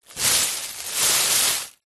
foley plastic bag thin empty movement rustle handling short